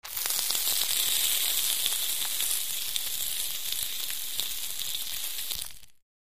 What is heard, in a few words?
Meat Sizzle Small, cooking